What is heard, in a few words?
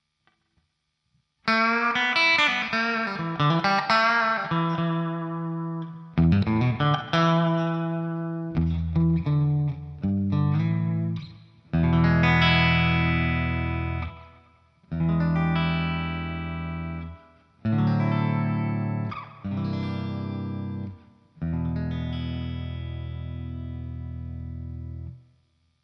bridge clean, telecaster